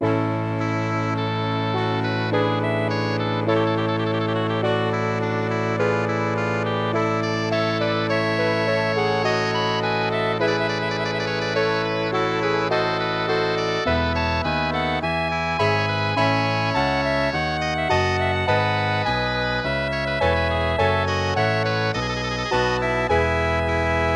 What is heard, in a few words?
MIDI